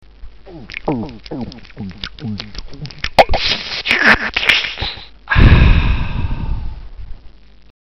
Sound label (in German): trinken